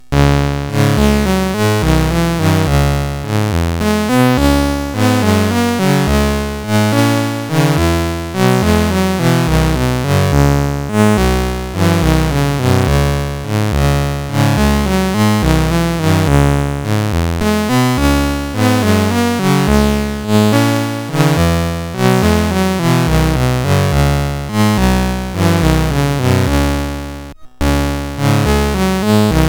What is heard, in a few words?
Scarborough Fair, sid CSG8580R5